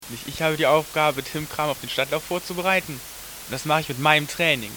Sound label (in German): stadtlauf